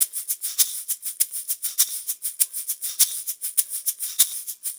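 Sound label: Hip Hop Shaker, 100 bpm; Percussion; Digidesign; Pro Tools; Pro Tools Essential Loops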